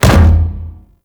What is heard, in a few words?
Breakout Hit One; Amalgam Carrus Pilot